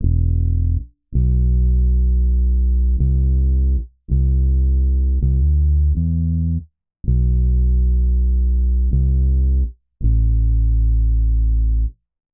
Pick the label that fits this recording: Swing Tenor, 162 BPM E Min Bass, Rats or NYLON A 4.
162 BPM E Min Bass